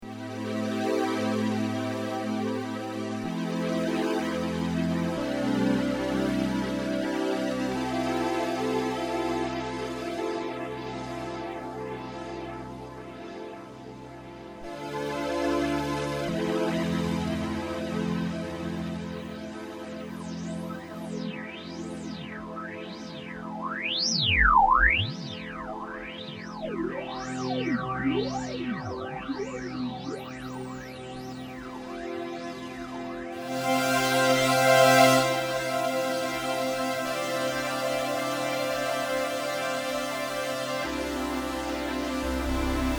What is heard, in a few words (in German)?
UDO Super 6 Jupiter 6 durch Analog In